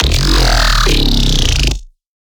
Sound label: Riddim Bass Hit Screamer Growl; One-Shots; Ghosthack; Riddim Essentials Volume 2